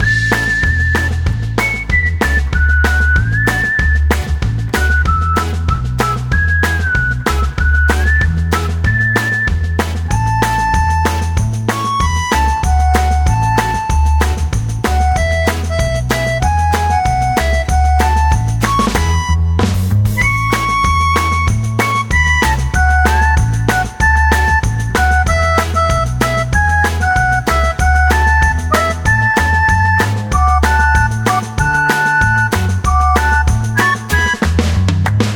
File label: Humorous; Samsung Movie Maker 1.2.06